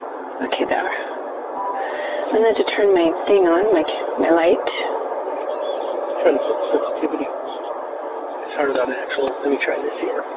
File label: whistling